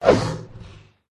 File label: dodge; mantikora